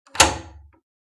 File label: Door key lock; Phasmophobia